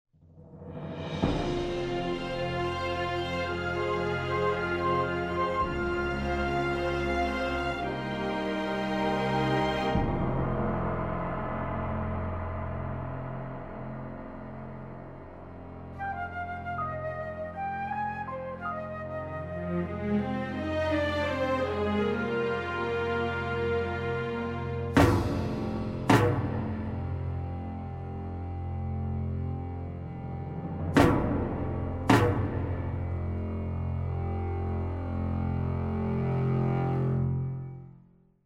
Music; Age of Empires III; cinematics

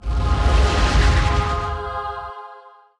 summon; RYL

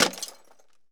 Bullet Into Glass; Sony Pictures Sound Effects Series; Ricochets - Hits - Whiz Bys

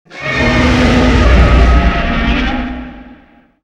Roar & Scream, Vocals Monster

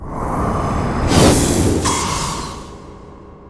Speed Buff; RYL 2

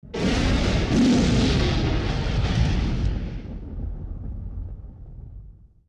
WWII Explosion With Flare; Period Combat; Sony Pictures Sound Effects Series

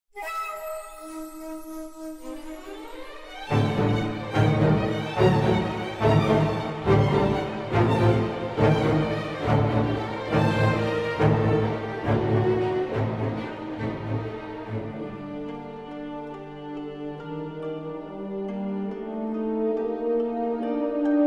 Music; Microsoft Studios; Age of Empires III - Complete Collection; cinematics

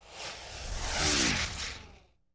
Cannonball Whiz By; Period Combat; Sony Pictures Sound Effects Series

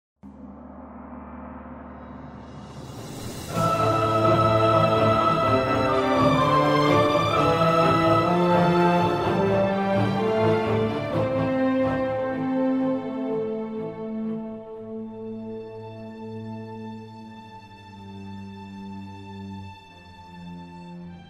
Music; Age of Empires III; cinematics

